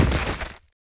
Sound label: drop, bear; monster